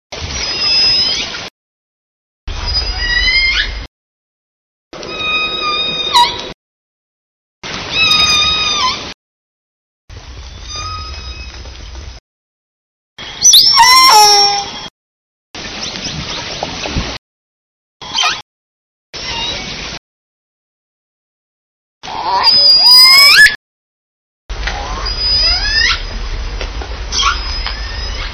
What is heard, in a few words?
L54s L84 L88 Call Samples, SRKW Orca Calls; Hyde